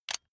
electric button drill direction switch forward heavy